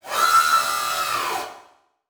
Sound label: Multimedia Internet CD-Rom Flash Animation